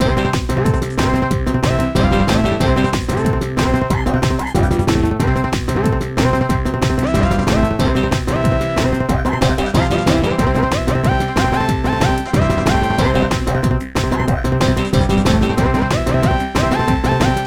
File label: upbeat